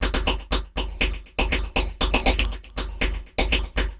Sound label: Percussion Loop; Electronic Point Blank